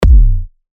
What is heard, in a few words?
Kick B; TECHNO; Gengar